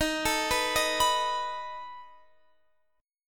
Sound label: B 7th Eb